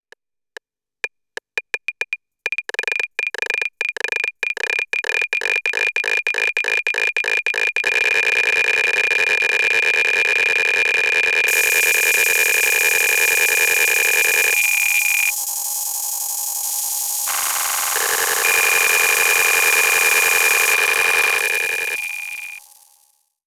Samples Drone; SoundForce

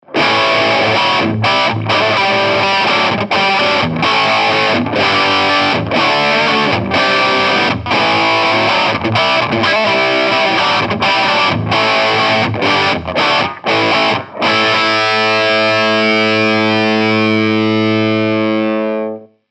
Mesa Boogie Fillmore 50 Combo Hi

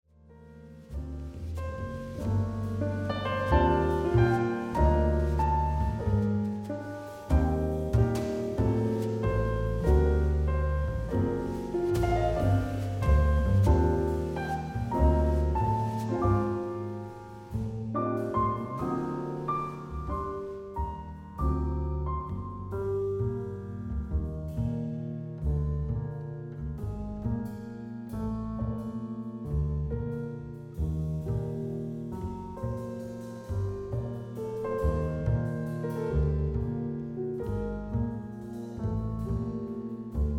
Jazz wet; Savant Audio QRS

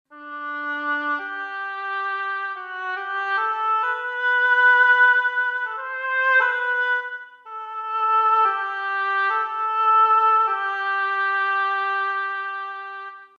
Oboe; Artinoise Recorder App

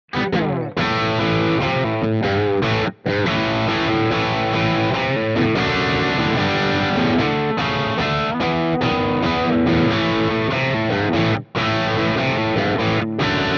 crunch Riff; MGK Signature

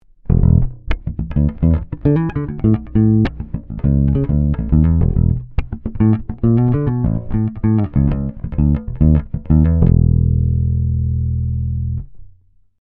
Ibanez EHB1505 Neck Passive

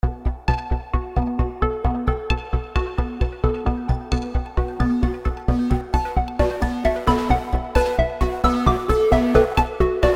PL Physique Marbles; Vengeance Sound Avenger 2; Generative 1

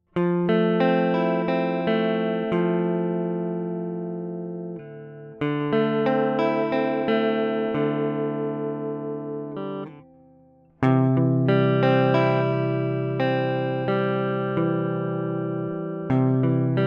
Guitar; API

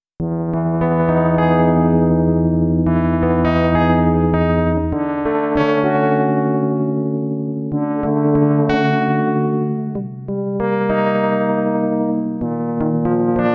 SYN Electric Piano; Headrush Software Update

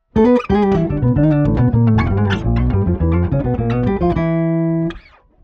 Bass Octave up; Quad Cortex

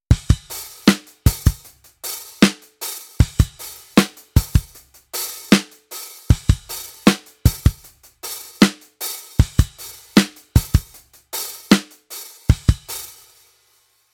Transient 33 Transienten 74 Sustain; HOFA IQ Series

